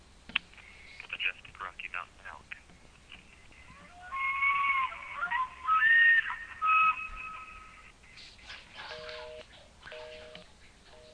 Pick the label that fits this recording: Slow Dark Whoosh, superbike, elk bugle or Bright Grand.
elk bugle